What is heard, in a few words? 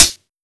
pedal hi hat sample g key; tuned drums